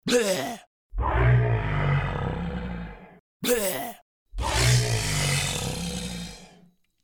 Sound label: Sanken Co100K pitch example; In sound design, one of the most used processes consists in modifying the pitch of a sound